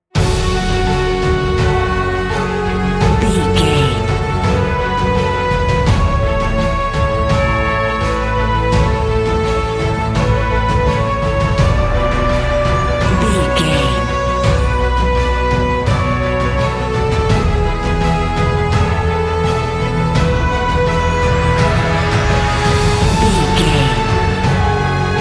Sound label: epic emotional